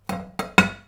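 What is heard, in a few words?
thermoresistant bowl; hit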